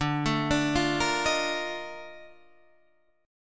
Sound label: A sharp Major 11th D